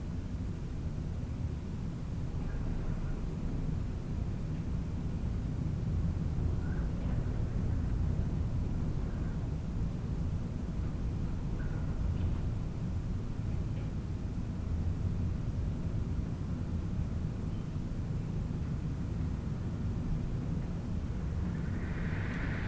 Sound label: Room Tone Wind